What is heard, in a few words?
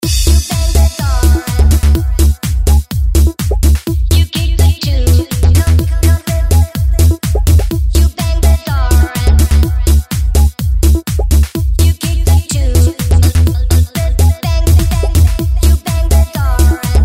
Electro Loop, 125 BPM